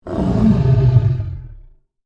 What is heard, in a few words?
Monster Groan, Horror, Monster, Groan, Creature; Monsters; BlastWave